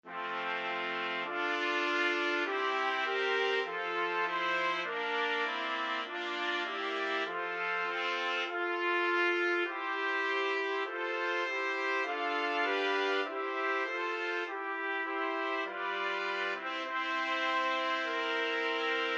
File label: holy holy holy trumpet quartet